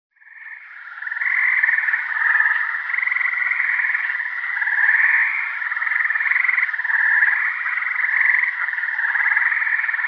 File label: frogs at night